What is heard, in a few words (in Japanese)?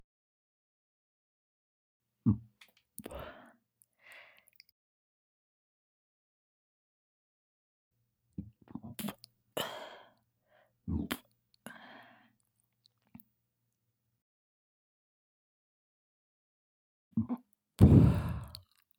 げっぷ, ASMR